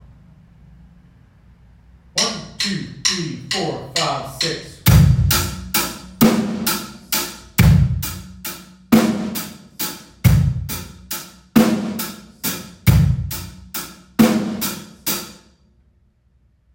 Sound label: 6 8 beat, drums